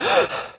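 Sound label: Realistic Fatigue Voice, male; oblivion; Imperial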